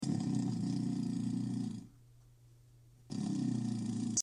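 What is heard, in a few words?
dragonfly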